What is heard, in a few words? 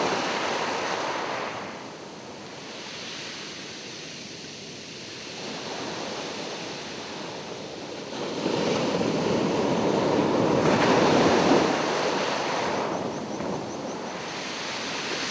Ocean Sounds